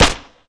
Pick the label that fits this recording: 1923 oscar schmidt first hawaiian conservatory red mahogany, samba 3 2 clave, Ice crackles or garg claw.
garg claw